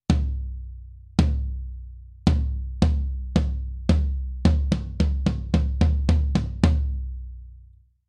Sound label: floor tom; lewitt; dtp340 tt